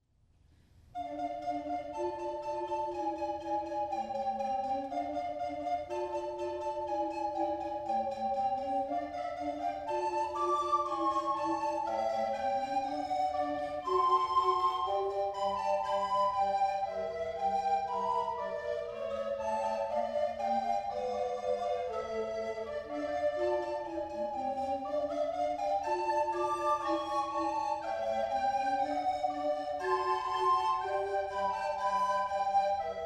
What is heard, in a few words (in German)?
Bach rocks, Orgel; Orgelschaetze; Hassbergen